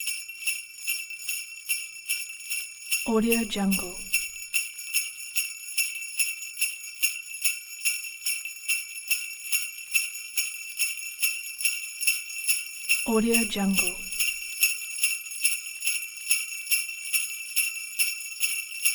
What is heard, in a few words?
jingle bells shake; blast drive 30; prosoundfx